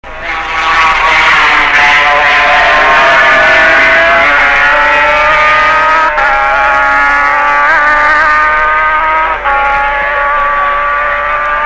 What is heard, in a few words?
Yamaha MZ 250, vintage bike; 1965 Yamaha MZ 250